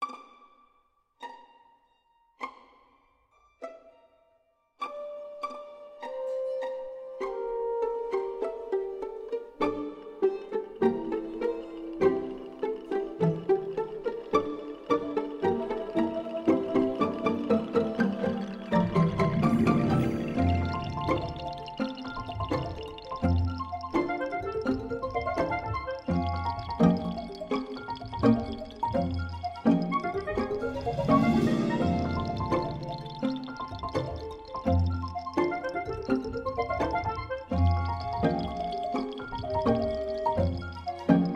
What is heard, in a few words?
Rainy Day (without vocals); Sprout Valley